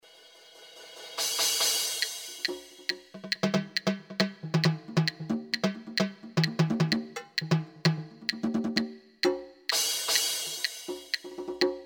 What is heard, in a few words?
Kit Latin; SIMMONS SD200